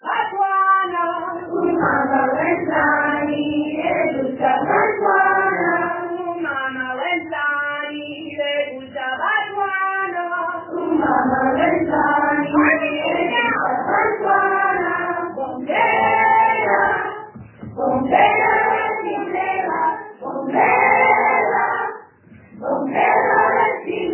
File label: Choir Sounds